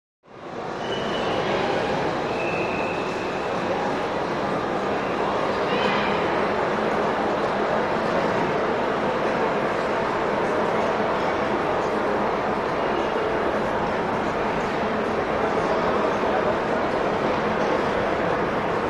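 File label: French Train Station Ambience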